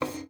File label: GUITAR FX; Xsample VOL-2